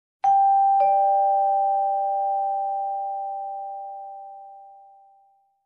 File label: Vibraphone doorbell melody